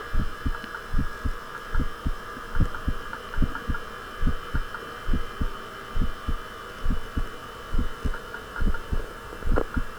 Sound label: heart